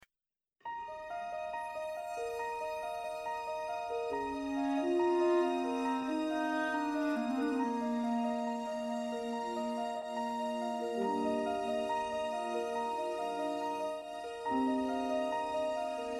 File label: Instrumental; Auditions